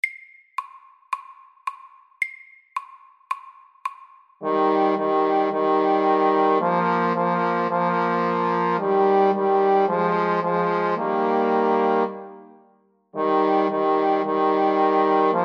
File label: First fanfare MEDIUM 110